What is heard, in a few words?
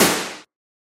classic gun shot; soundscrate